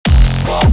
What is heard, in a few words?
Dubstep